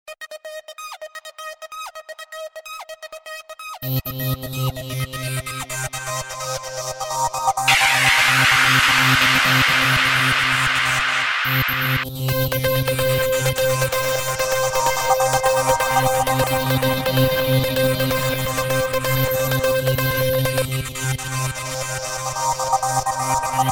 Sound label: ARP